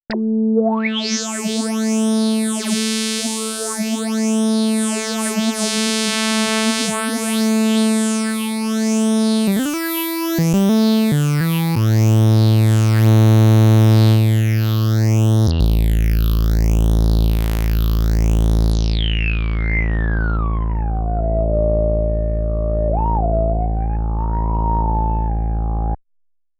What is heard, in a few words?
phaser